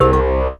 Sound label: HIT, STABS, House